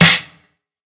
bright snare single hit E key, acoustic snare sample tuned to the E note